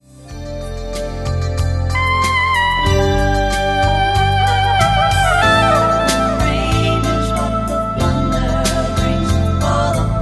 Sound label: Soprano Sax